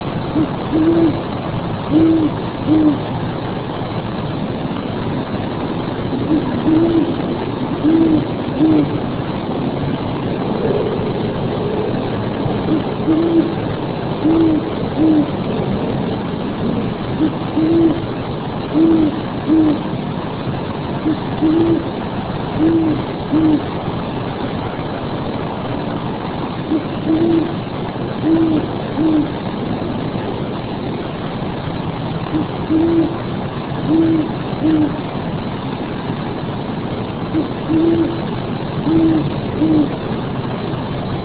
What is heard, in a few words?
owl